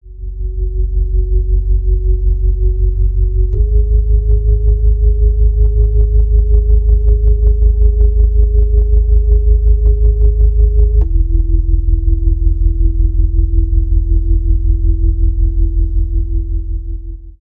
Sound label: Dark drone tense and dramatic